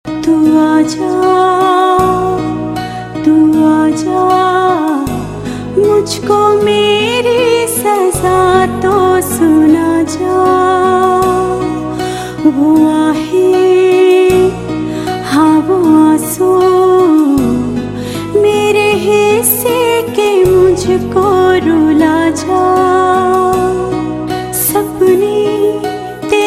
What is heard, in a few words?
Female Ringtone